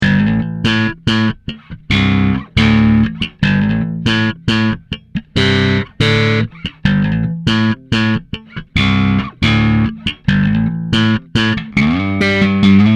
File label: slap